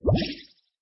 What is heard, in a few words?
audio enter; hunan